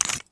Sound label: usp silencer screw, weapons; cstrike; csgo